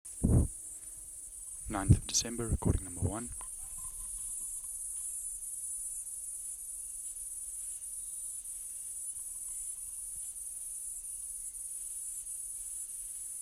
Platypleura sp Kaning'ina Forest

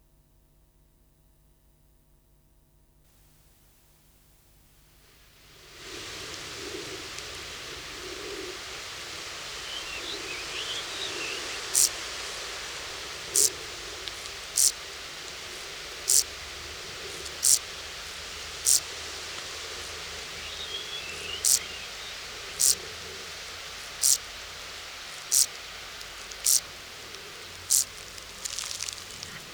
Tettigonia viridissima

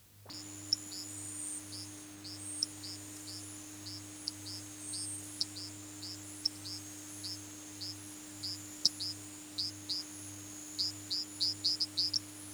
Rhacophorus appendiculatus; Lawit Kelebang

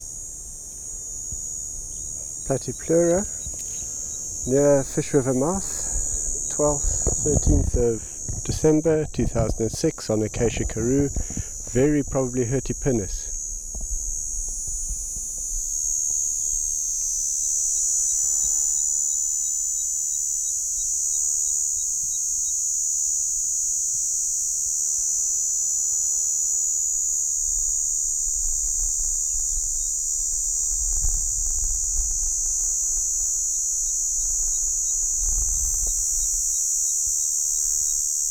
P.hirtipennis Fish River Mouth

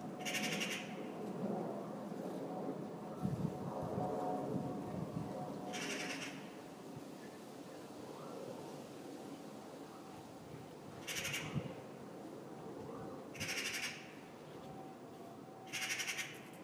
Magpie

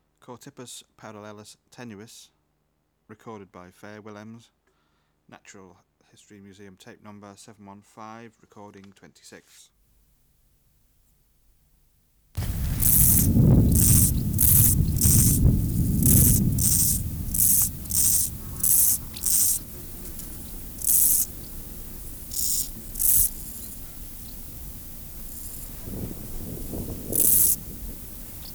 Chorthippus parallelus tenuis

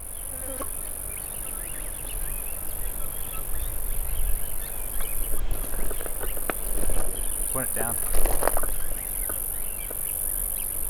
pale whiner; NS

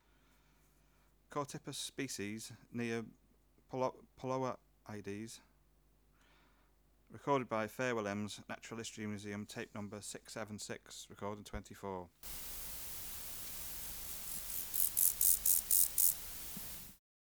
Chorthippus sp near pulloides

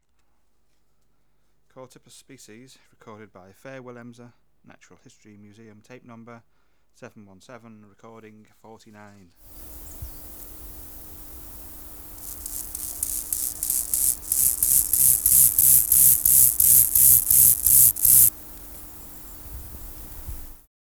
Chorthippus

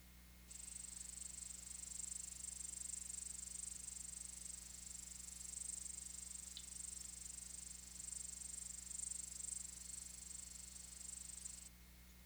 Okanagana canadensis

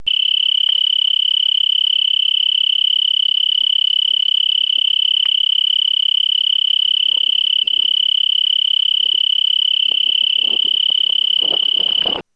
Oecanthus pictus, 18degree Celsius

